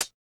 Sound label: hihat, house